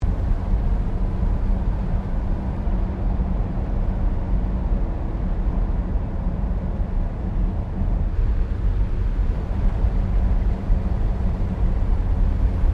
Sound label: CAR HOLDEN FJ CRUISE LOOP, Classic Australian cars; Car Holden Fj 1955; Cars (Sound Effects:Vehicles)